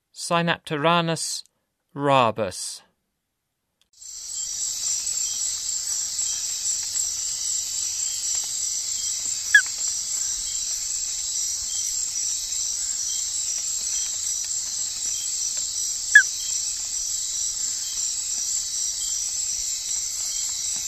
Synapturanus rabus, Frogs of Ecuadorian Amazon; S. America

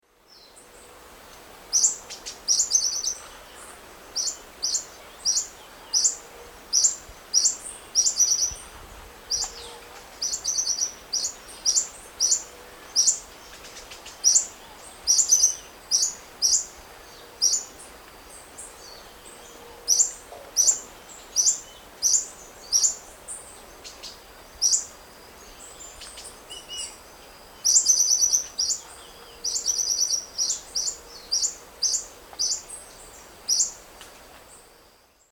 Leucippus chlorocercus, song and calls; S. America; Eastern Lowlands

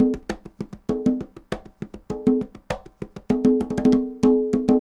conga 100 bpm, latin; Sound Effect Library

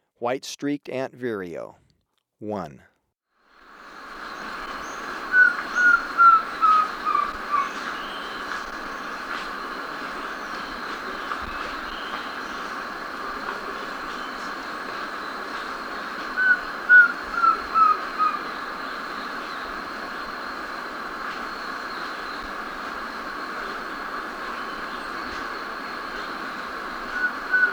White-Streaked Antvireo (Plumbeous Antvireo); S. America

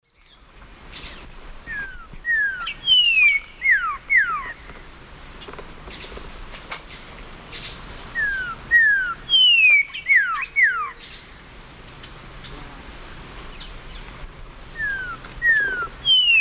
Saltator coerulescens, song; S. America; Eastern Lowlands

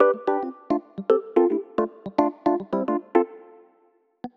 Rhodes; Rock; Keys; Rollerskate Rhodes

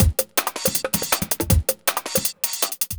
jungle juice 160

